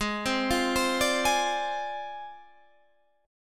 D-Minor 7th Flat 5th G sharp, chord

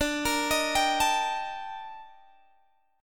D sharp Major Sharp 11th, D

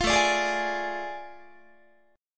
A Major 7th Suspended 4th Sharp 5th, Guitar; AM7sus4#5 strummed; 20,17,17,18,18,19; 4A,4E,5A,5D,5G#,6C#

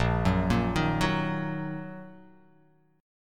Bb Suspended 4th Sharp 5th Bb down, chord; arpeggiated

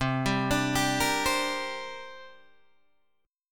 C 7th Suspended 2nd C down Guitar Open D

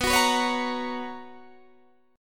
B Major 7th Suspended 2nd Guitar Standard, chord; BM7sus2; strummed; JGuitar

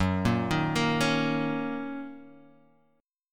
Fsharp 6th down, F#6 chord; arpeggiated; JGuitar

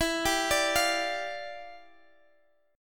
E Minor 7th Flat 9th

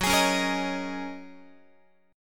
F sharp 6th Add 9th F sharp Guitar; strummed; JGuitar

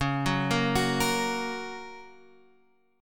F sharp Major C sharp

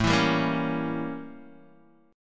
A 7th Flat 9th Guitar Open D

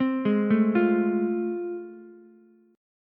A Minor Major 7th Sharp 5th, Ukulele; arpeggiated; AmM7#5; JGuitar

